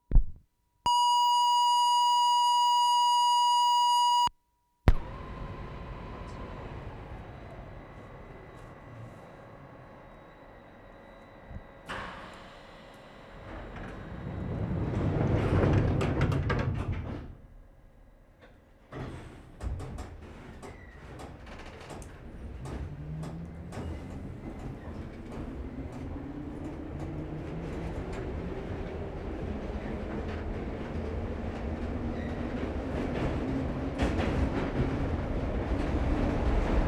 Subway ride, begins with doors closing and ends with disembarkation at 2nd stop. Good take, London subway system; Subway approaching (with a racket), boarding and beginning of train ride